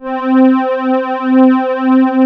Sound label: Poly800 C2, Korg; Back In Time Records; Korg Universe VOL-1